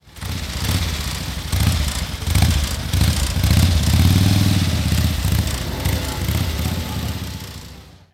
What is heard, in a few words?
AJS 1000